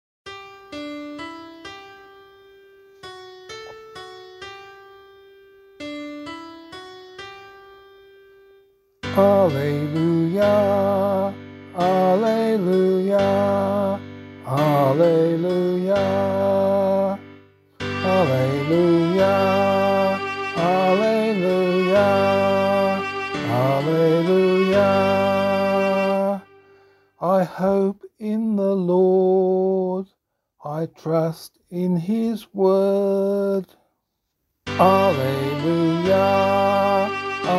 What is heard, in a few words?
Gospel, vocal